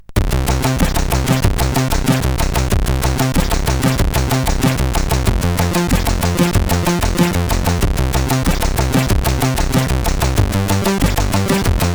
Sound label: sid CSG8580